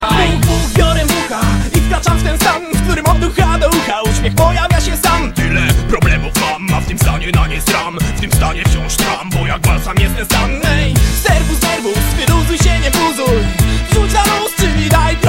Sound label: Reggae